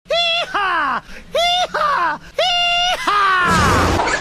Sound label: Laughs; onepiecedle